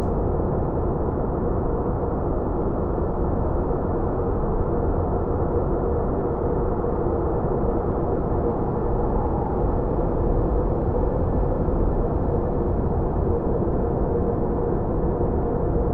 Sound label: wind tunnel